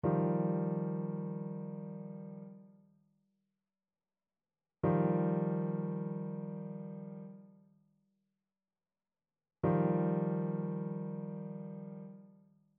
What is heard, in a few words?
trichord; trichord identification